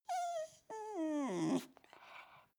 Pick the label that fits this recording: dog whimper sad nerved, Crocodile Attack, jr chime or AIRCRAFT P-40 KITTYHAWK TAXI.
dog whimper sad nerved